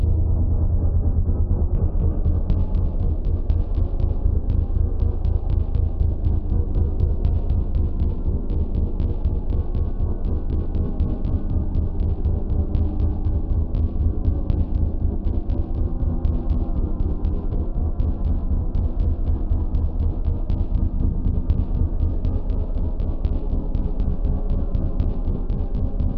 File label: Intensity Layer, Music